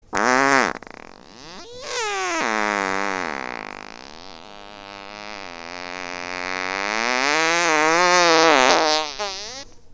Pedo

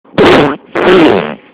Violento en 2 fases

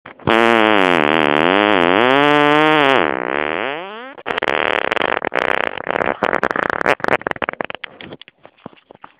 Pedo que se cala

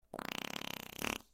Con motor

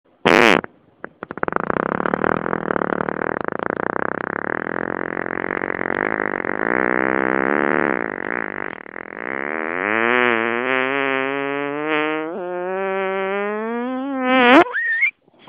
Pedo

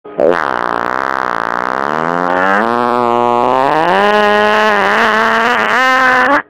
Pedo; girona

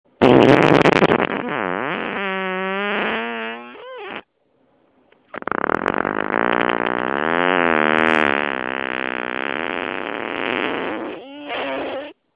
Pedo arriesgado

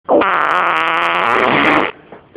Pedo de fraga

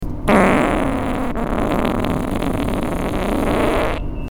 Pedo

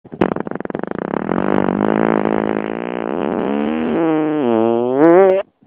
Pedo desfragmentacion

